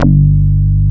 Synth Bass, Analog Bs

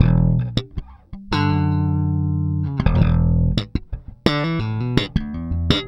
Slap, Bass; Bass X

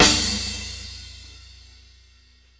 snare n cymbal; Sonic Foundry (Sony Creative Software); Ugly Remnants VOL-2; Beatstuff

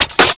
PERC, Drum & Bass; Ueberschall; Drum & Bass Flash Trackz

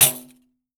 14 PANDE, PANDEIRO

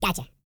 E TALK, Vocal Planet R&B Dance; Spectrasonics

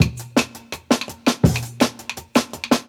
10 Pin Beat; Original Jungle Breaks

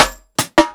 PICCOLO 80 FILL, drums; Basement drums vol 2

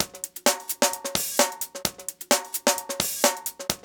130, DRUMLOOPS

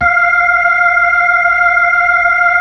B-3, Brite; Keyboards of The 60's and 70's

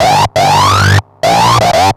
Analoop, Industrial Analog

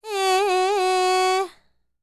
World Voices, INDIA; ILIO; Vocal Planet

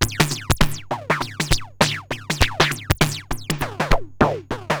Moog Freqbox RX17 (100BPM), cyborg beats

